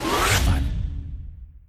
warp jump; Pixel Starships; Android

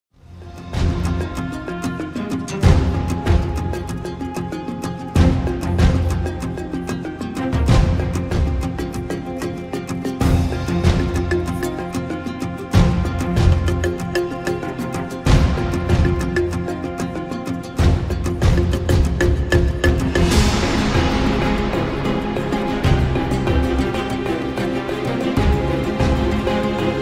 Beach Battle Music; ARK Survival Evolved

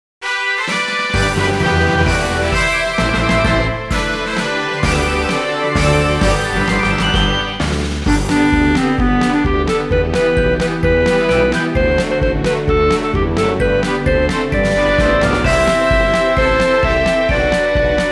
Anthem (Instrumental); Powerful High School

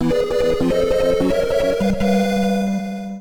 Hurry Up Jingle; mario tennis open; Special Game - Super Mario Tennis

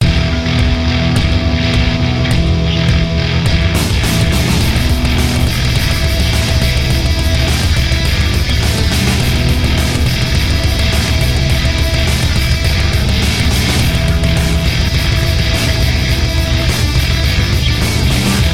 Punk Music; double homework android ios online gamerip 2019

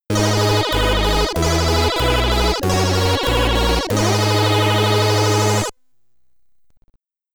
Stage Clear! (SID Stereo); Eskimo Games; commodore 64

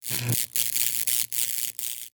Electricity; SFX CircusElectricity

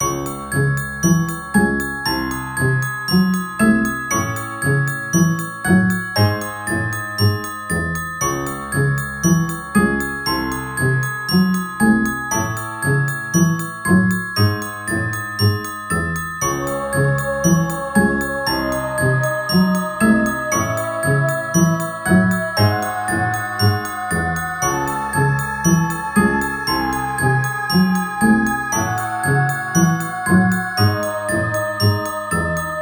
Level music; Fireboy and Watergirl

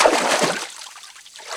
Footstep water Heavy

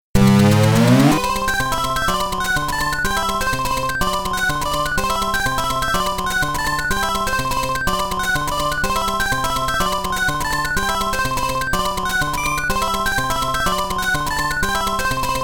Power-up; virtual boy; Mario Clash

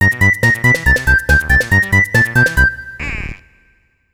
(Jingle) Banner; Dr. Mario Online Rx

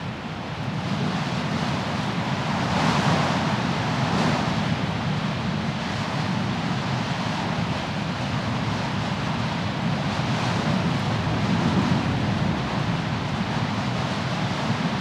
Wind; 1080 Avalanche

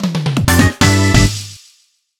Race Start Fanfare (Time Trial); Mario Kart 7

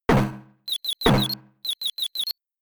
Dizzy Punch

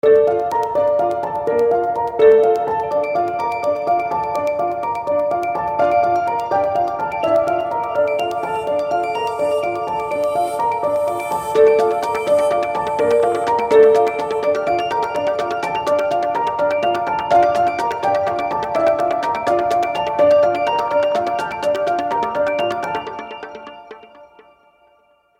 Guitar theme; Terra Invicta

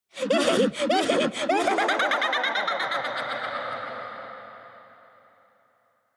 Ending Laugh; Devour

